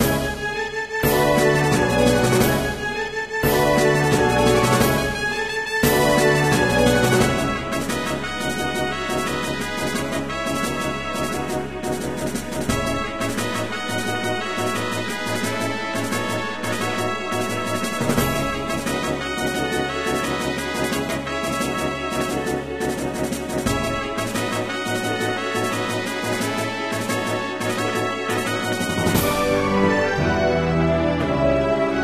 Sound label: Negative; MapleShrine; mkxp; gameasync